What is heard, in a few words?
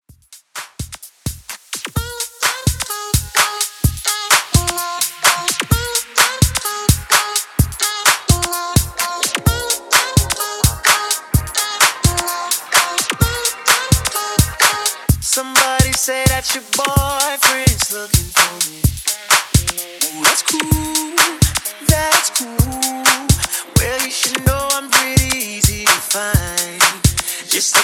R&B Club Dance 128 BPM